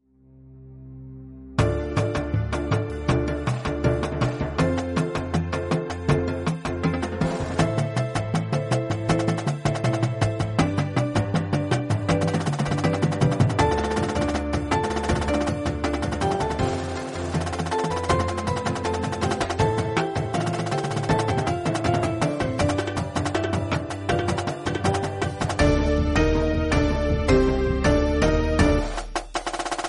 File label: percussion sample; Drumline with Hornline